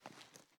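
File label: assault rifle reload elbow; weapons; gmod; viper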